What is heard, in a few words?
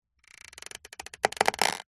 plastic creak stress; zapsplat; foley